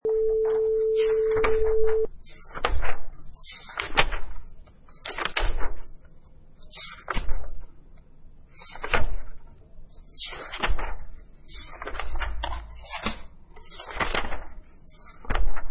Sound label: Train Station Background